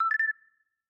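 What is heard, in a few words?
announce low; keezers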